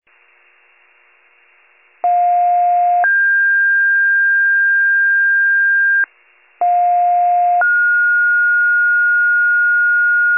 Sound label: FIRE pager alert